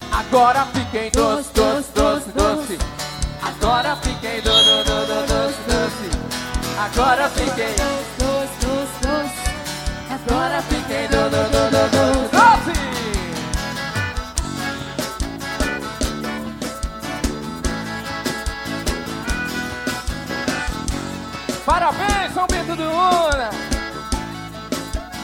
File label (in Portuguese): sertanejo ao vivo; sao bento do una